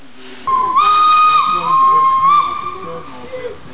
fluit kort; Noyon

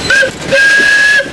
Blanc fluiten

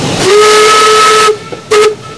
Cail fluiten

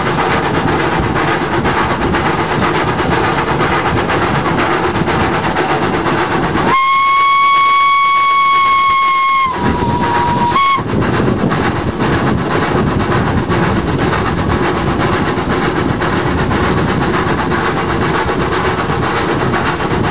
vaart fluit; Aisne

